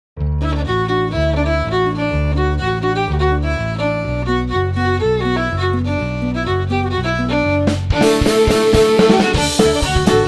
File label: Drum Violins Guitar Cover